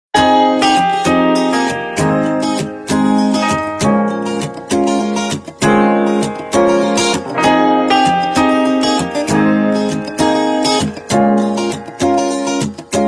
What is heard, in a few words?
Huawei Nova 11 Pro, ringtone